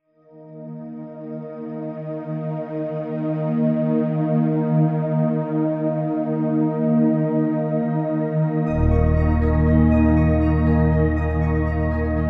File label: Underwater